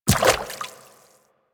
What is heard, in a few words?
Infested Medium Run Water, footsteps; Warframe